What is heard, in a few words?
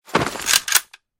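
quick preparation for a shot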